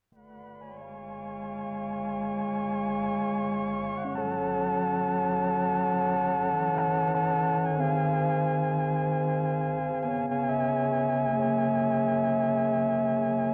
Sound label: clavioline